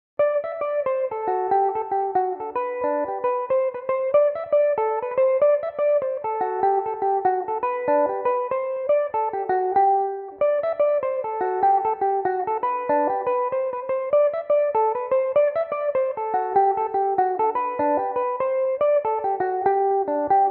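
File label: G major, Irish mandolin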